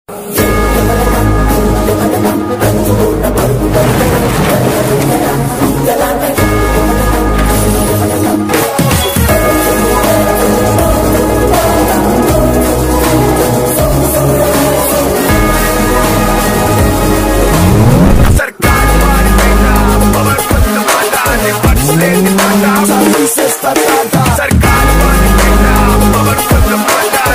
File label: trailer bgm ringtone; sarkaru vaari paata; mahesh babu